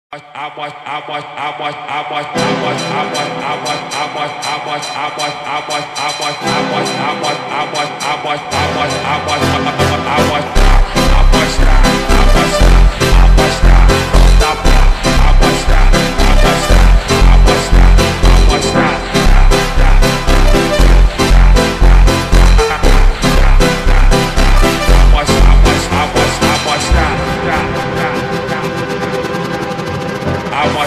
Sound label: Phonk